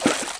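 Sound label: water hit; baseq2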